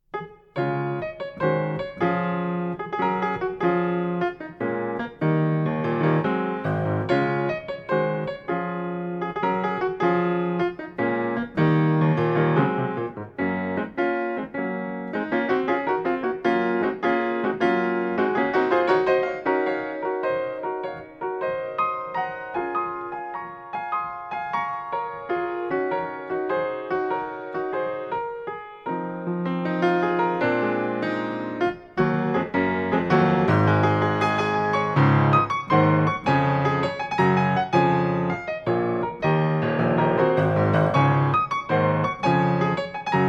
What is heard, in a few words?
for piano hands; funny yellow maple leaf